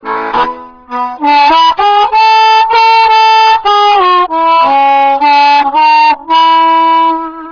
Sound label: Draw Bends, diatonic